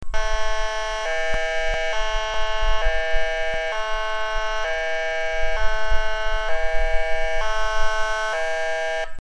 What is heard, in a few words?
Airhorn Hi Low, siren; whelen